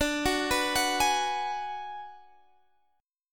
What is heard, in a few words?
B Minor 6th D